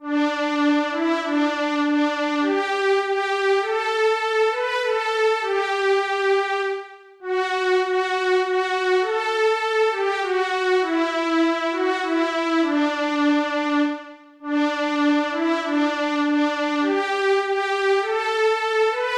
Ännchen von Tharau G-Dur 100bpm, Volkslieder

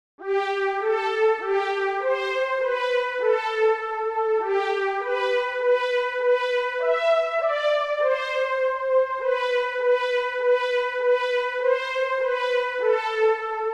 C-Dur 100bpm, Volkslieder; Der Mond ist aufgegangen

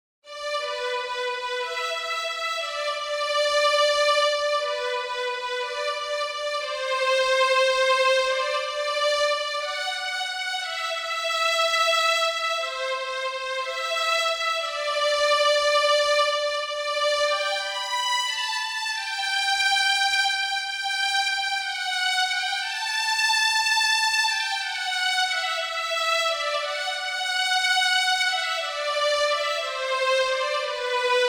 G-Dur 180bpm, Volkslieder; Mariechen saß weinend im Garten

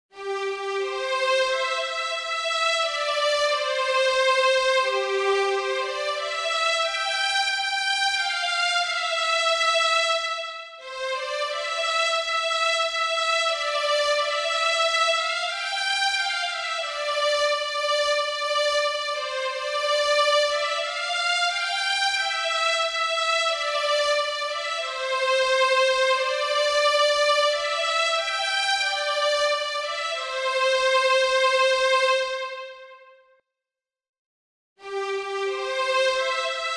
Ich hatt' einen Kameraden C-Dur 90bpm, Volkslieder

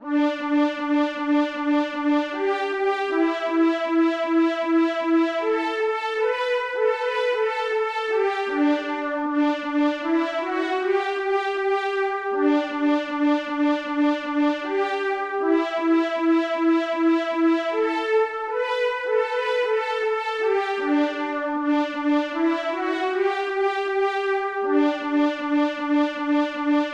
G-Dur 78bpm, Volkslieder; Auf de schwäb'sche Eisebahne

